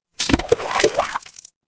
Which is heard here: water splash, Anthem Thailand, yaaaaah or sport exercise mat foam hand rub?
water splash